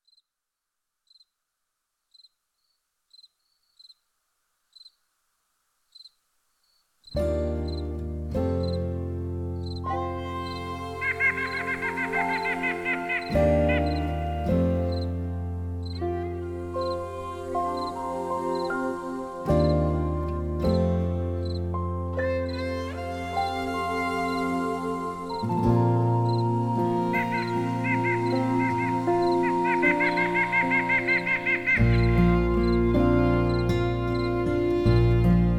Forest Cello

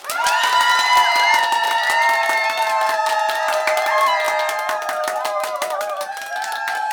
SMALL CHEER; 1000fx

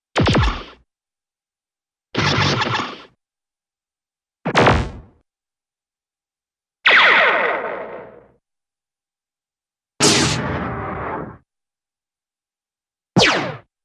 Laser Blasts; Hollywood Edge Cartoon Trax

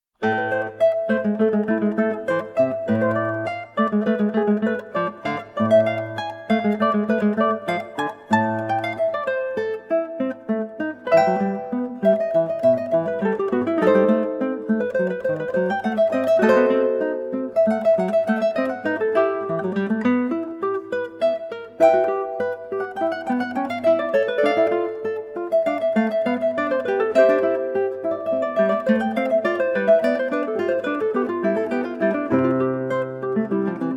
Prelude 20, Guitar and Ukulele; book 1 WTC BWV 865; JS Bach

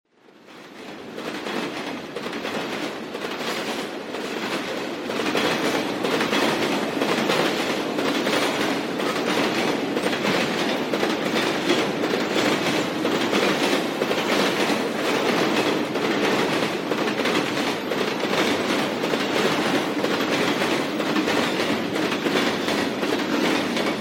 Elevated Train Pass; SOUND EFFECTS1

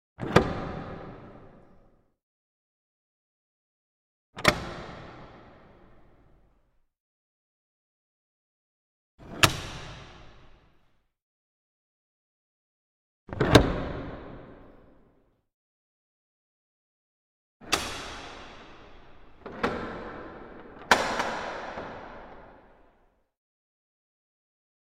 Bolts Various Reverberant In; Hollywood Edge Historical Series; cd4 Castles Palaces & Monasteries

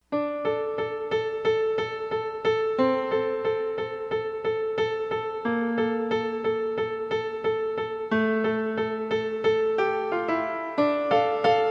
Prelude No. 7 in A Minor, Solo Piano

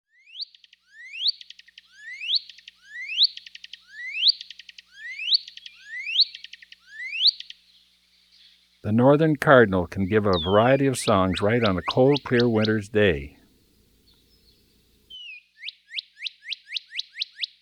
Northern Cardinal; Eastern North America; Dan Gibson; Wildlife Identification By Sound

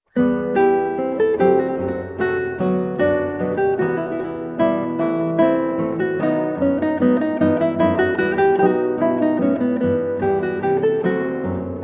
Minuet from Flute Sonata in E Minor, Guitar; HWV 375; Handel

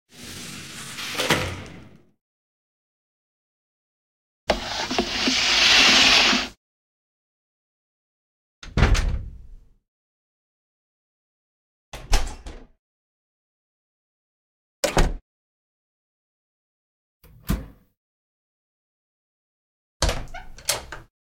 Doors; 100 sound effects

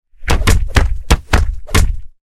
Kick Block Punch Combo; Hollywood Edge Martial Arts and Human Impacts

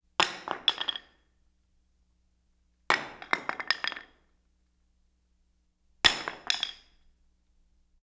Sledgehammer Drop Concrete Solid Metal Impact; Foley; Props; Foley Essentials

